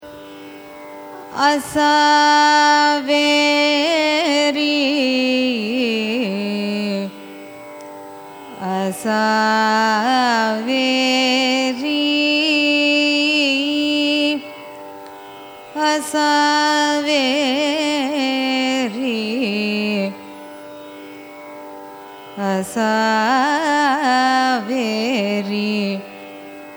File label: raga asaveri signature; carnatic music